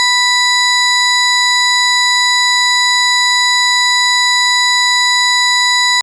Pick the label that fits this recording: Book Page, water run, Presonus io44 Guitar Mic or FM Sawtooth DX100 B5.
FM Sawtooth DX100 B5